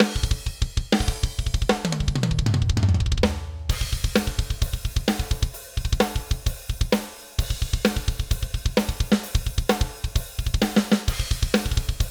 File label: Metal